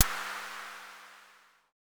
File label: FX; MPC DSM-2